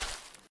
grass, footsteps

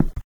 carpet, footsteps

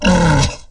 hurt, aliens; ortnok

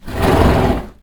horse snort

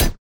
nail impact

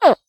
baby croc

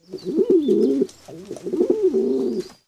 bird pigeon

